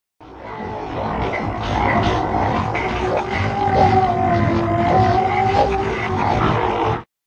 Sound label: super spooky panning ship, Atmospherics